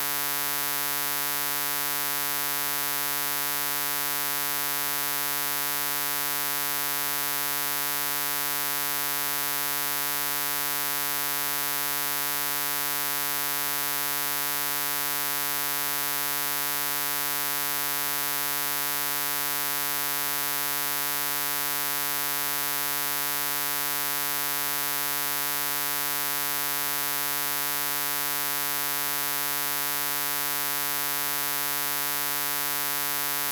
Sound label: sweep reference; enzner2008